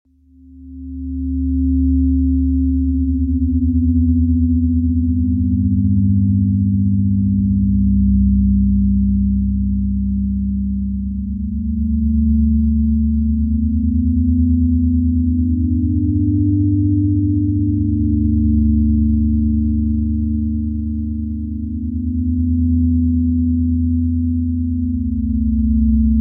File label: Menu Music For Video Game